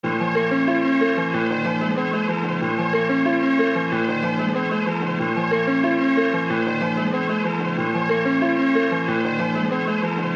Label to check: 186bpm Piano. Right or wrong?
Right